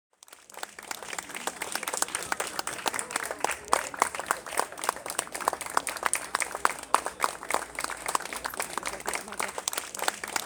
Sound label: big applause